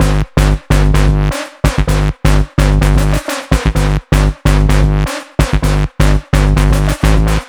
Crazy Chip Melody 3, 128BPM; Vengeance Samples

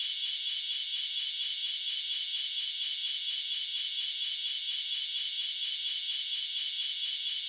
Ride, 128 BPM; Vengeance Samples; Vocal-Hook Kits; Song Kit; Keep Believing

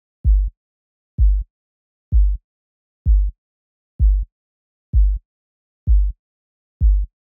Hopper Sub, 128BPM; Vengeance Samples; Hopper Kit

